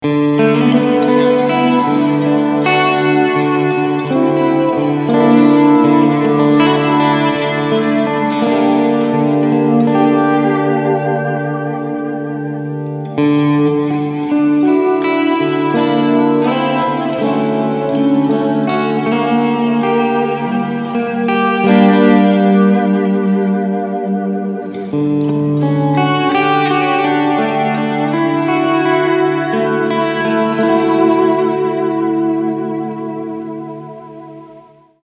AMP Mod

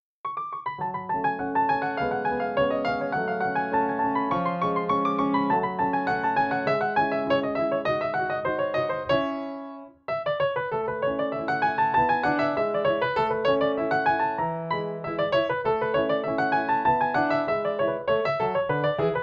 Nord Grand Fortepiano

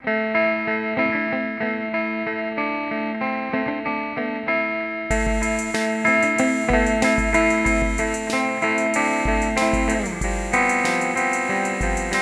EHX Mainframe

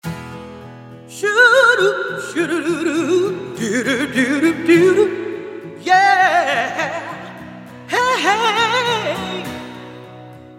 BOSS VE2 ENH Green Reverb

